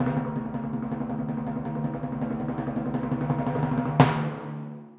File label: Timpani, Orchestral, Rock Pop; Sonic Foundry (Sony Creative Software); Orchestral Series VOL-4 - Rock and Pop